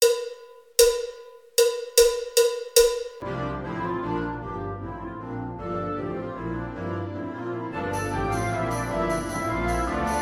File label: Spanish Dance